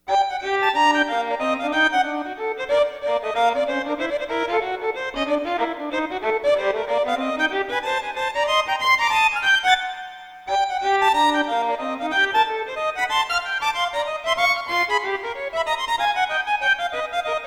BOP; Duet

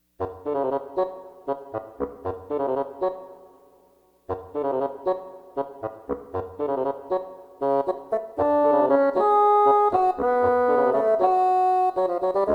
TANGO; BASSOON; Duet

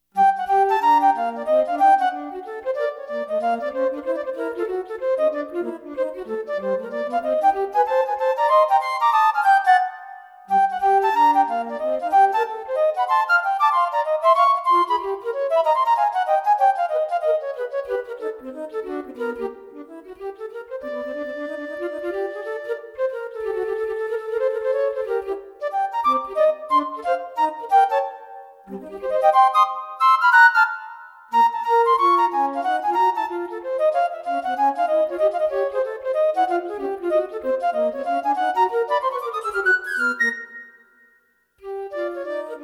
BOP; Duet

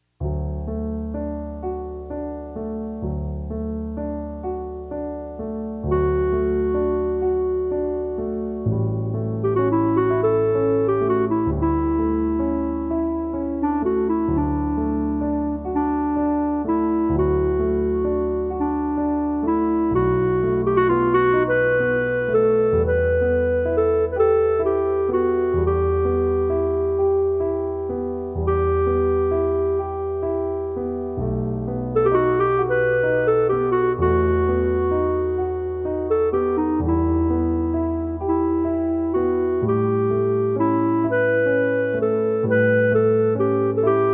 Casta Diva Clar Piano; Clarinet; Norma

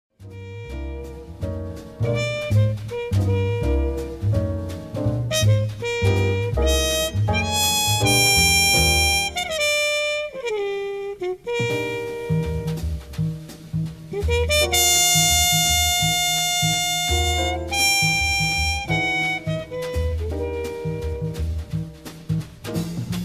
Solo; TRUMPET

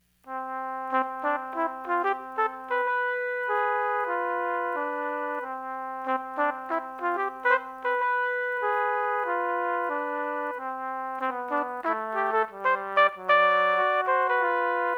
2 TPT

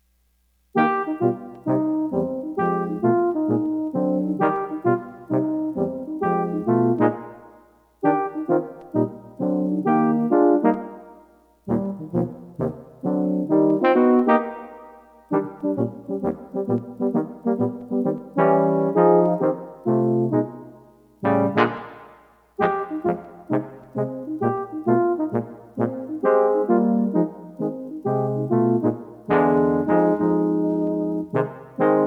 NO LEAD PART

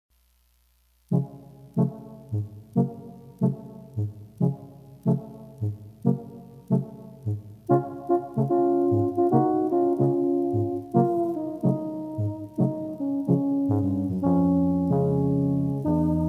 4 Tbn; TROMBONE QUARTET; Standards

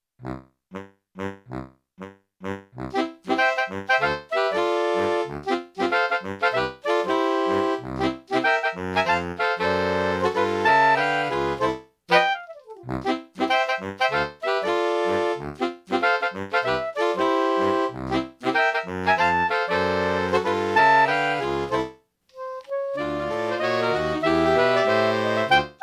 Sax Quartets, A bit of a caribbean flair; A bit of a caribbean flair for this old standard.

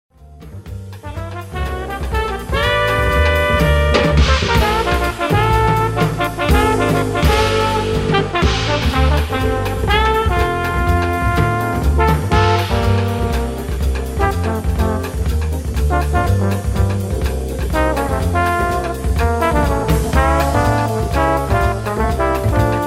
Duet Part Added

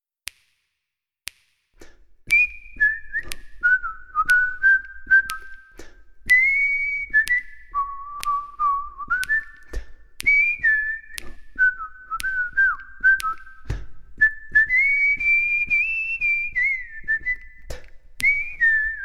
Whistle

